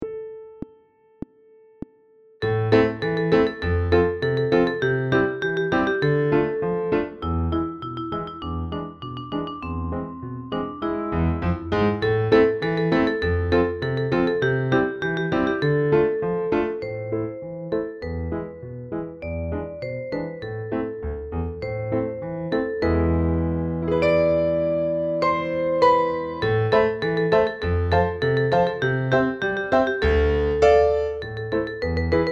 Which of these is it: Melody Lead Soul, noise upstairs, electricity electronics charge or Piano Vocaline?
Piano Vocaline